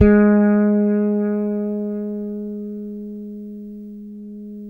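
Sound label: 6-string fretless bass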